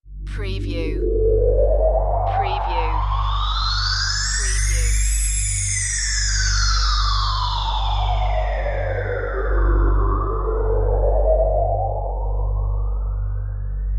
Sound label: Forma Swp Ambient